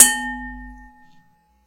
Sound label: Lamp